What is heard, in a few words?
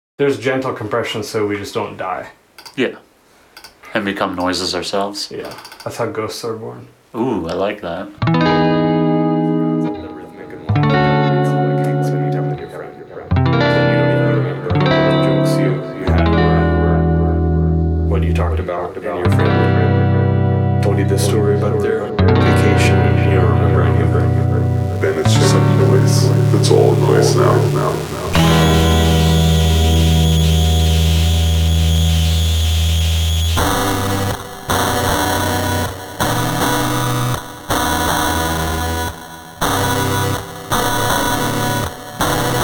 noise